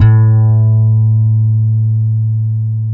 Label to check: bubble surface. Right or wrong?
Wrong